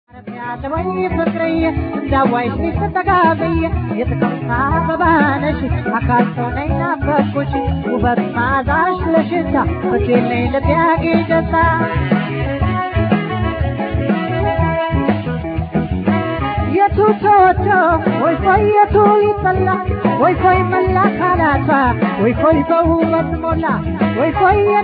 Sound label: tango